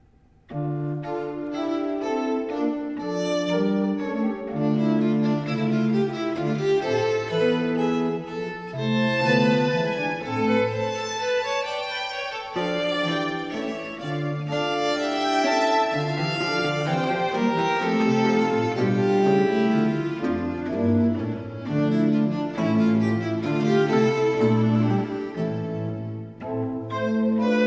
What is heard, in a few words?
Sonate 7 in E minor - 2. Allegro; Arcangelo Corelli; Corelli - Complete Works; Klassika